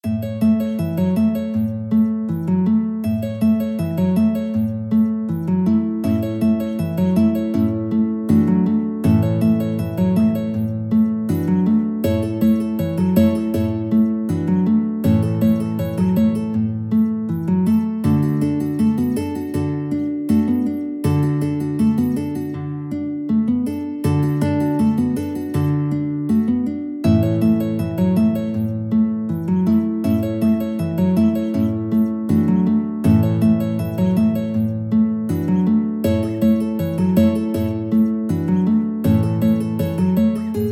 guitar ensemble

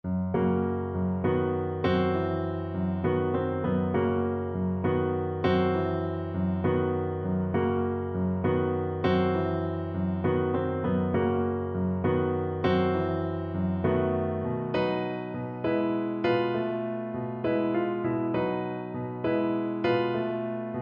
Flat Blues piano

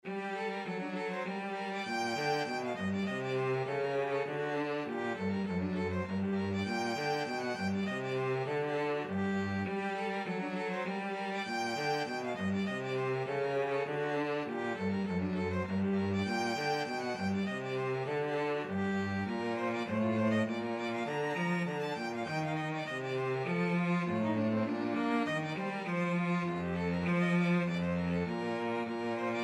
violin cello duet

